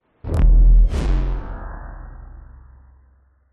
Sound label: sound design cinematic hit lfe sub followed by buzz